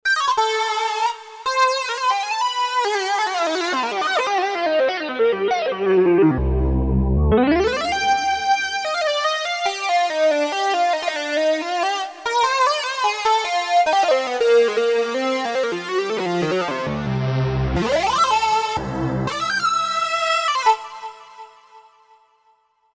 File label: Mini Lead, Korg Wavestation A-D